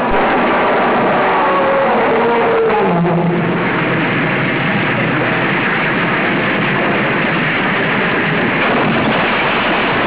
duel cliff crash

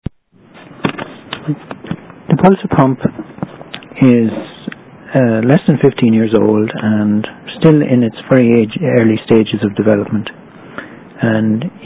pulser chicken; gaiatech

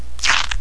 squish

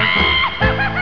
maniacal laugh

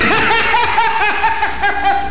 Laugh; The Joker; Batman's greatest enemy

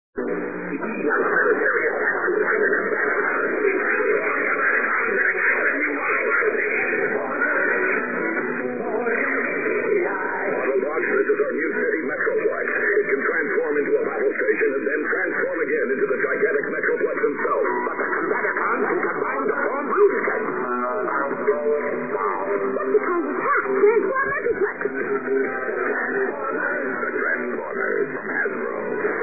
metro

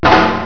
tap

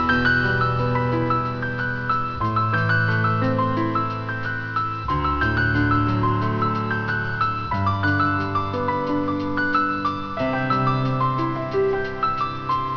piano

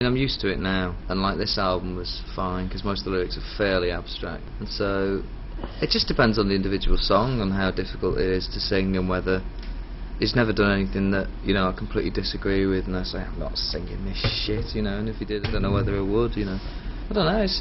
boo

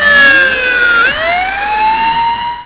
police

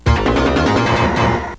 Victory

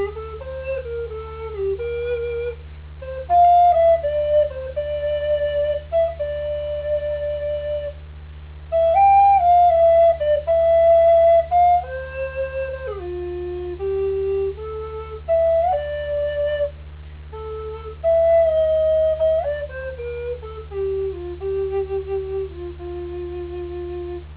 recorder tenor

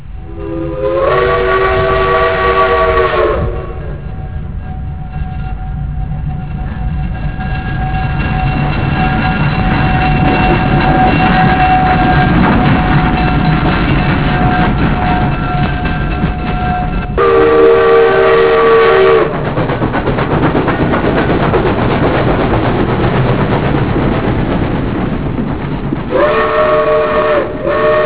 up bell whistle

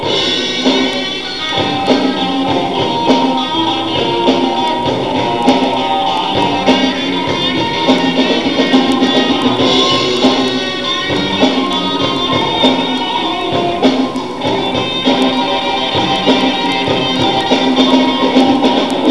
guitar solo

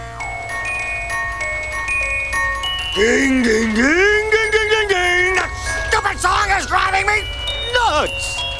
ding ding

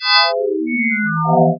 METALLIC

